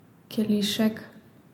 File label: glass